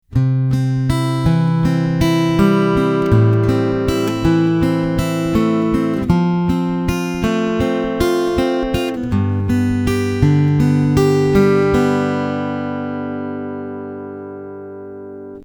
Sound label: odd fingerpick, acoustic guitar